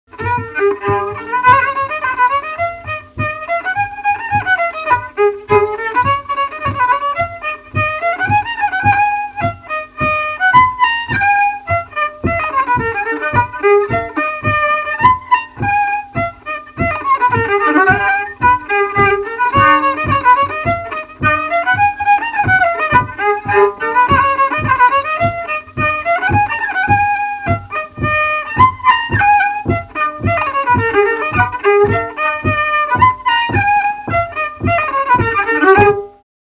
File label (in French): Polka; Polka de saint Donat